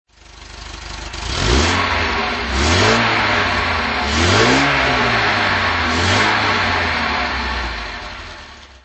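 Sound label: Volvo P1800ES throttle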